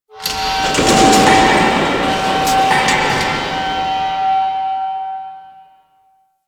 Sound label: Mining Machines, Asteroid; Ambience; Warframe; Grineer Asteroid